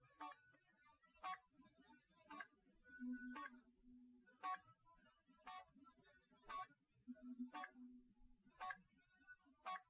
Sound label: no drums, live rec, 250ms, 5cm; SiSEC08; SiSEC underdetermined